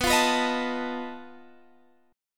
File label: B 7th Flat 5th B Guitar Standard; B7b5; strummed; capo=2